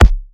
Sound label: kick scythe